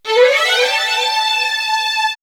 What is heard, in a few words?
String Run, string section; X-Static Goldmine; backtothe70's